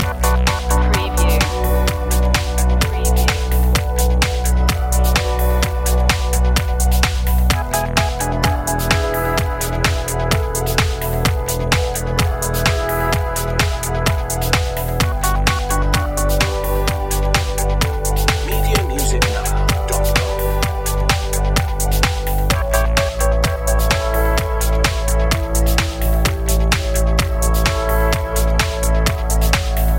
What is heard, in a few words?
dreamy electro loop